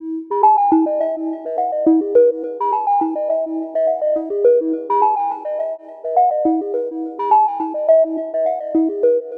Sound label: umbrellas melody; Melody Loops; TRUTH-HZ; Crowdsourced Sample Pack